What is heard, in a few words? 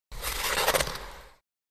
Ice Skating Grindin